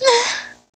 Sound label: Moan